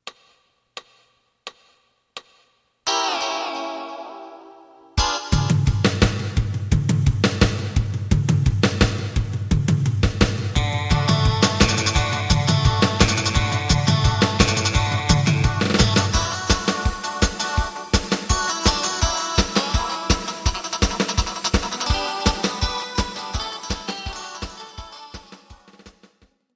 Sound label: bass